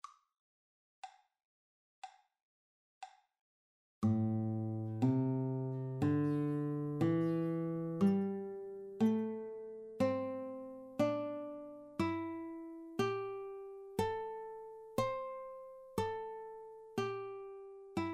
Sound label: A Pentatonic fretted, guitar